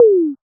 Kit Tom, Realistic Concertmate-670

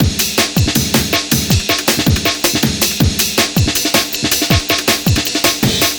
Boomin Beat, Drum N Bass; Sonic Foundry (Sony Creative Software); Knutrix Drum & Bass

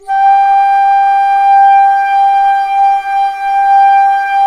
ALTO G4, ALTO FLUTE; USB Soundscan vol.23

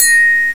XYLOPHNE, Fairlight CMI, MALLETS

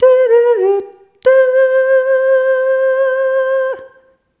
Rhythmic C

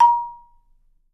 A#4, Percussion & Harp; Miroslav Vitous - Percussion & Harp

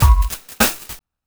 drbreak, drumbreaks; Masterbits; Vinyl Attack

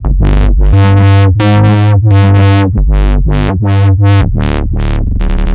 Yark Bass, Drum n Bass; KJ Sawka; Drum n Bass & Beyond

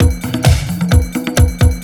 130 DISCO; USB Soundscan vol.20; Fresh Disco House I; AKAI; DISCO ADD

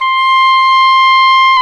oboe, Oboe Brth

